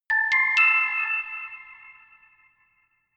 notification glockenspiel creepy triad up minor

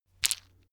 stone gravel clatter hit; natur-tiere; steine

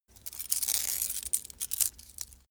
small metal scratch; industrie wirtschaft; metall

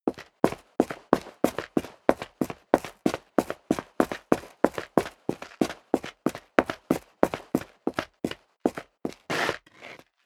step leather shoes concrete grit run sequence

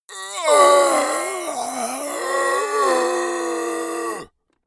monster die; kreaturen

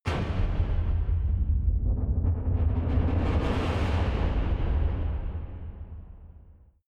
massive metal rumble

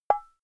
glass short; signale

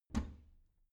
bathroom cabinet doors open soft; objekte; tueren

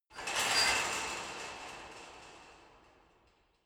metal squeak rattle; metall

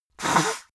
nose blow dry short; soundpool

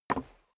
step boots wood creak single stair up; schritte

